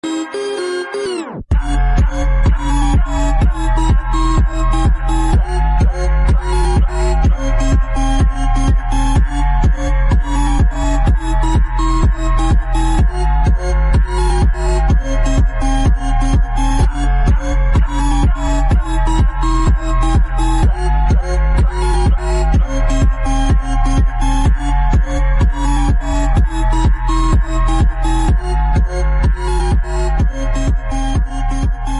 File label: iphone bgm remix